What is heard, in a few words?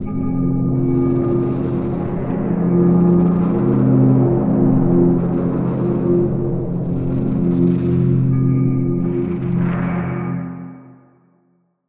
drone misery F, drone pad texture